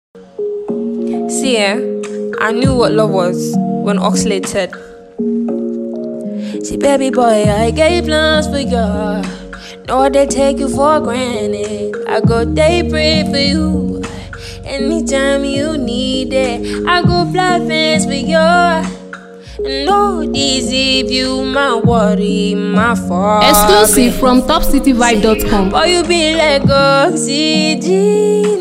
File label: Cover Version